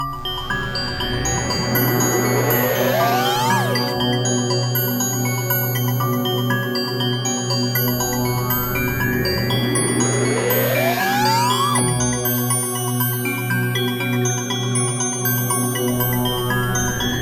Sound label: Horror Lullaby